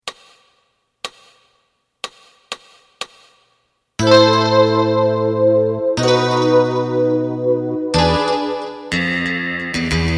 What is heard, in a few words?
drum